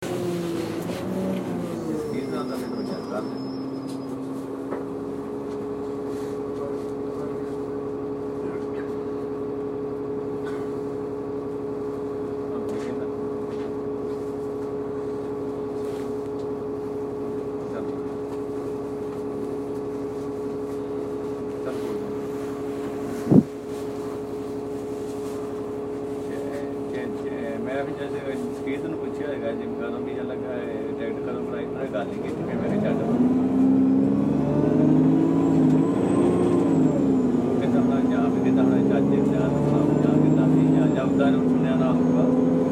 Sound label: On A Bus